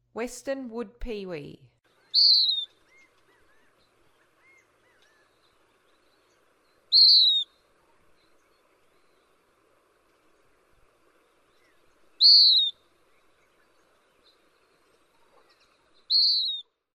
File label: Western Wood-pewee, Songs of Birds; North America; Western USA; Western USA Songbirds